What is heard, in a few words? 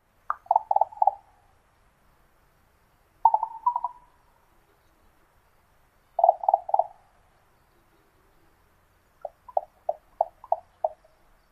raven alone popping call